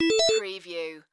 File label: Casino Slot Machine Organ